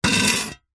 industrial shovel spade metal short stab scrape on concrete; zapsplat